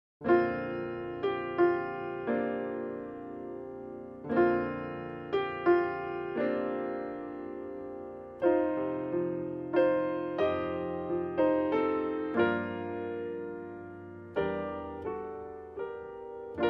Silent Night, Solo Piano

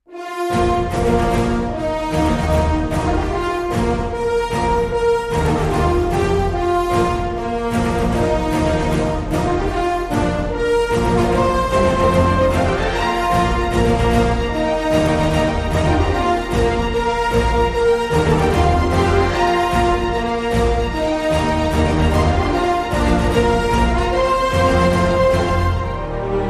Light Percussion

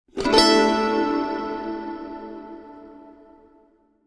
Dark Tension, Sting

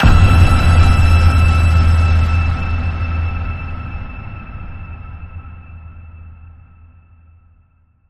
Ping Frequency, E

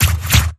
Sting Compressed Air

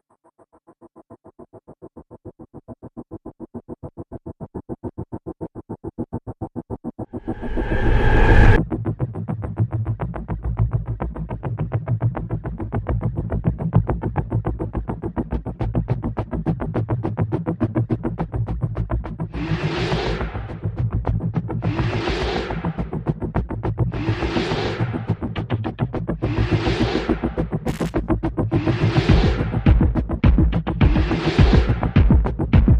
Rhy Pulsetastic

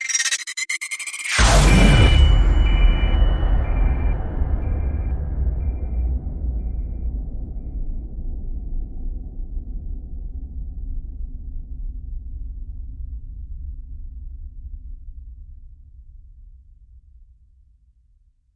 Hit Theory

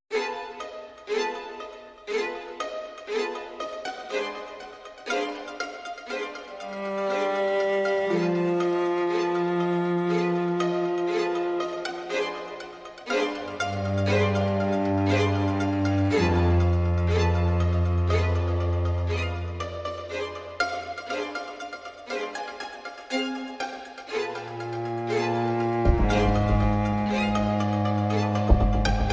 Aggro Strings No Drums

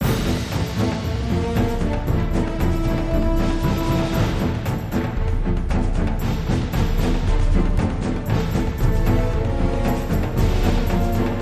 Advancing Drums

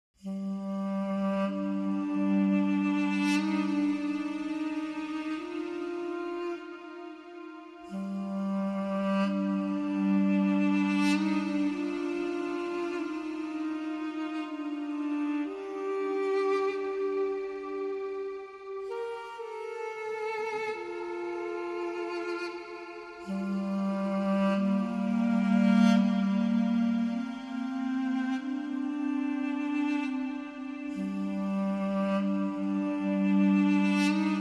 Duduk Only

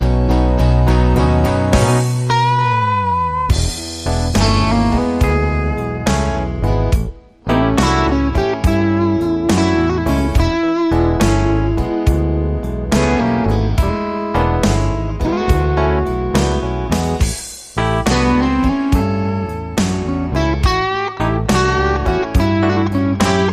Slo Mo Blues

